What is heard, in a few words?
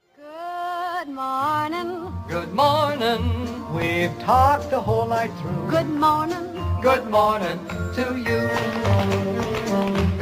good morning ringtone